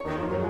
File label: Music Dialogue Neutral Start 60bpm; Asterix Obelix Slap Them All 2